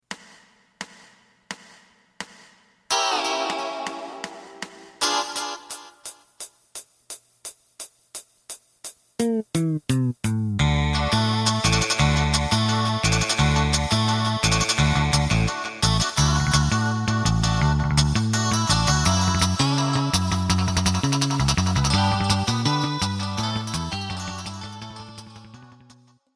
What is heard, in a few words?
drum; エレキバンド用カラオケCD